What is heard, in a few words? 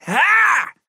effort melee big; Trapper